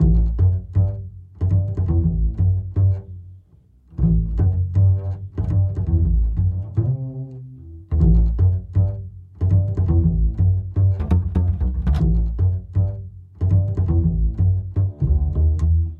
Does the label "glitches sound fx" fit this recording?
No